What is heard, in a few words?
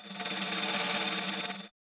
DRMS, Underground Hip Hop; USB Soundscan vol.02; AKAI